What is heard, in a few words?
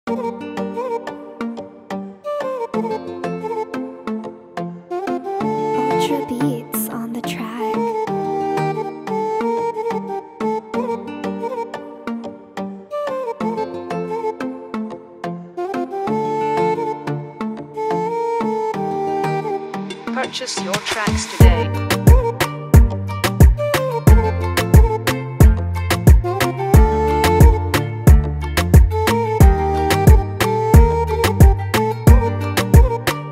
oriental reggaeton type beat instrumental